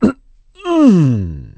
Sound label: "Ahem"; Chudley (fancy shopkeeper): "A-hem...!"